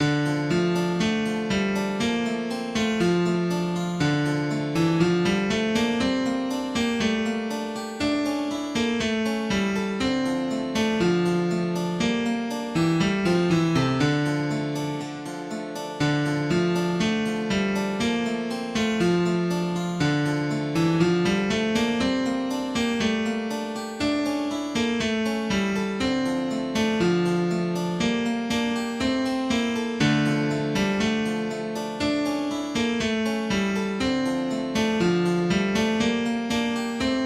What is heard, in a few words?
Music